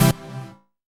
HOUSE, House Dance

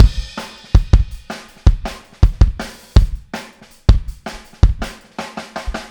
Fill Kick, Drums, 162 BPM; Drums from the Big Room The Mixes; Take Your Toms Off

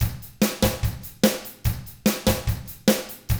rock drums

